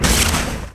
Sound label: Bow; nox